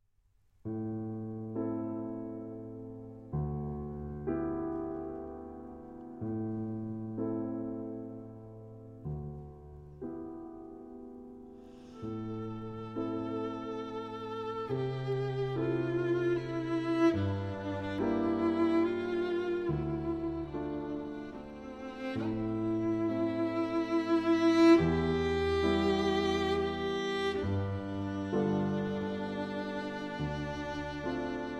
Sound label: Cello and Piano; Gymnopédie No. 3